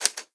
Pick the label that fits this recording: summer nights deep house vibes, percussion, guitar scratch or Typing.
Typing